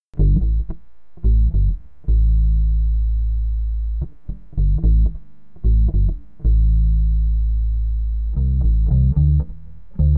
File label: Blues for Birds without Wings (Duet)